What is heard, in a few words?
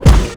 biomech arm impact soft